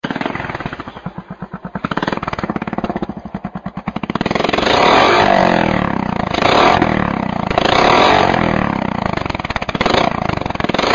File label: Savage Raask Dragpipe